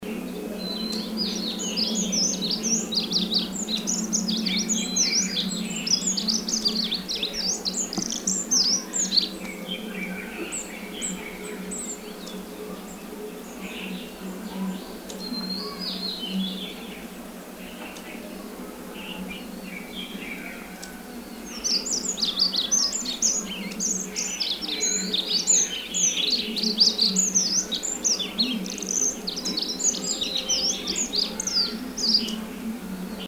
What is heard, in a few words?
Olive Backed Pipit Song; Guangxi Shanli